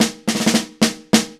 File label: snare; Alexander